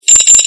coins

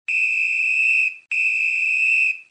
Whistle Police

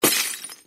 Bottle Smash

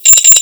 coins

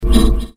wzhuh

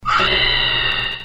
Scream

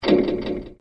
Enter Door

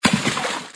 Splash

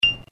Beep

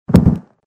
Pillow Hit

